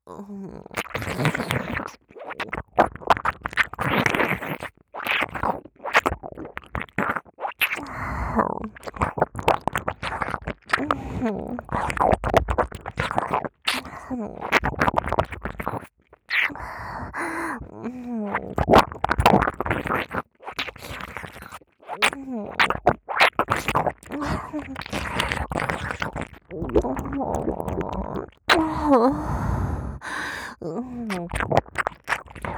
耳舐め, ASMR; 左; 媚薬で限界を超えたビンカンちんぽで口マンコ犯し

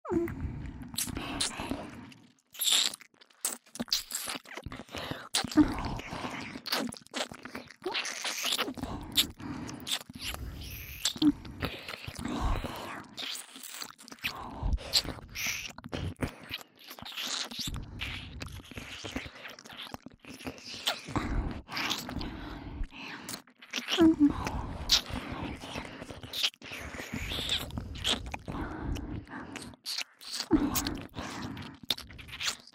両耳舐めループ, ASMR

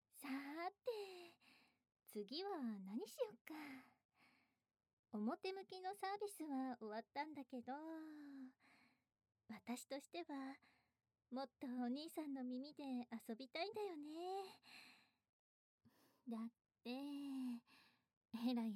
耳舐め＆囁き耳責め

